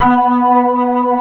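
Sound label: C3, major minor violin trill; East West; Global Sounds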